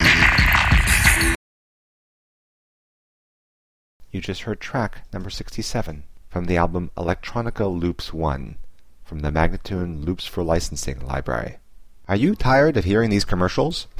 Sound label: 090 E spoken; Electronica Loops 1